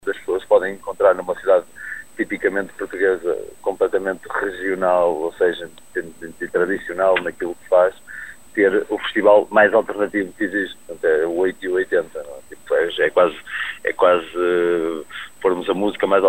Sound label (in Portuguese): rancho electronica; neopop; Viana do Castelo; festival de música electrónica